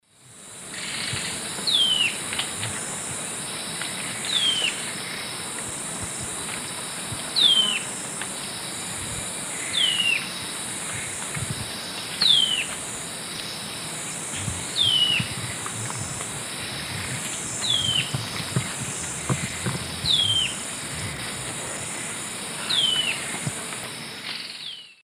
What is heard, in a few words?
Microrhopias quixensis, distress call male, S. America Eastern Lowlands